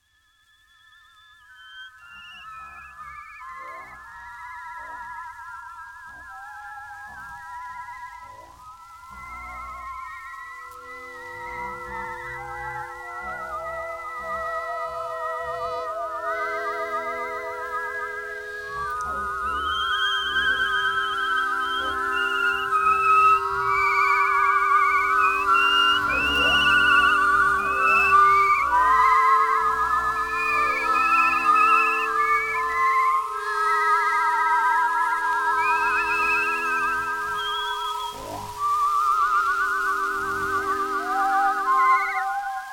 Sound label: Whistling